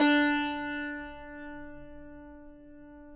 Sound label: cumbus; Roland - LA Composer vol. 3